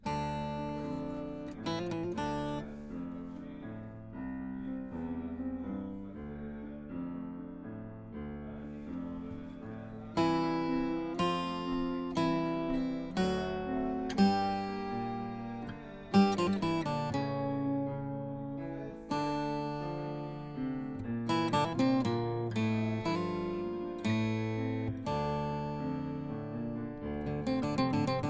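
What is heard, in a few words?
guitar B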